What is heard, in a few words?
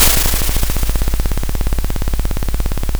pop, Sound of a drum roll